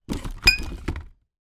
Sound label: foley glass bottle place onto box bang on other